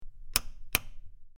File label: Rotating Switch Clic